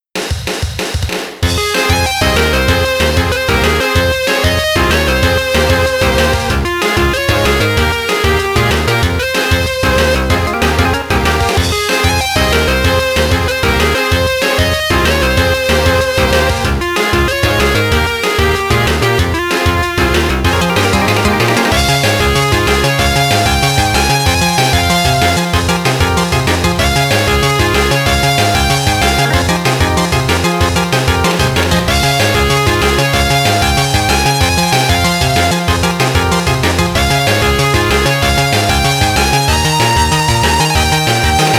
Battle BGM

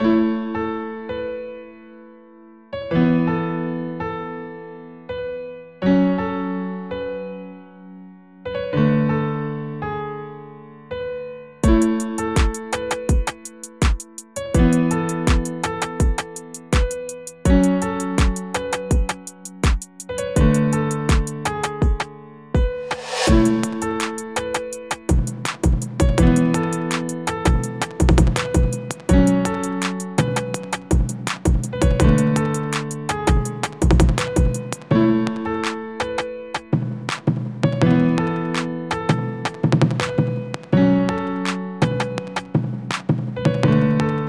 Pause - Character Selection Theme; Friday Night Funkin' Vs. Yung Lixo

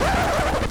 Musical dance scratch; lego star wars the force awakens 2016 ps4; STARDRAWERS

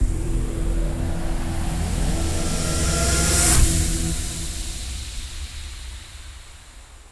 Transition; Everspace 2

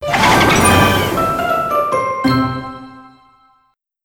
Crunchy Finish

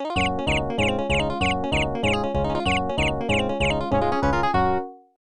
Referee Appearance; Butasan; arcade

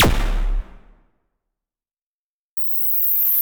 Boss Shoot

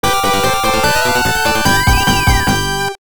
1-Up; sonic hex adventure; genesis

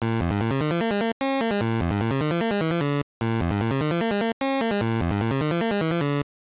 Challenging Stage Start; Namco Classic Collection Vol. 2; arcade; Namco ND-1

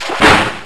Waterfall

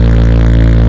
Car Low Loop; Nicktoons Nitro; arcade

